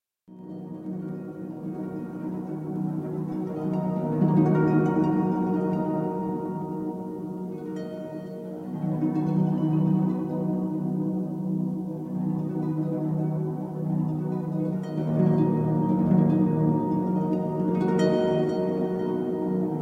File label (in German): harfe; ehepaar